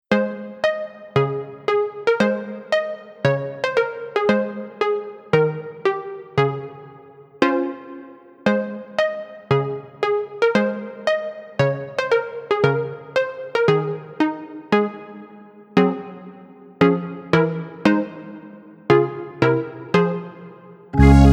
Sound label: retro